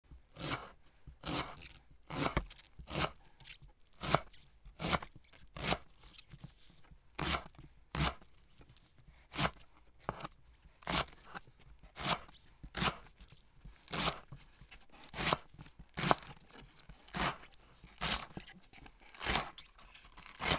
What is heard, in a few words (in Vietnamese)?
âm thanh tiếng cắt tỏi hành tây trong bếp